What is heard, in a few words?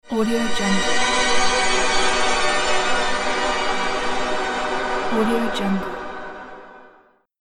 paranormal mystery magic